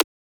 Transient; FLPRO

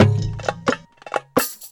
Loop

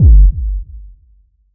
Drop Overdrive, Sub Drops & Boom FXs

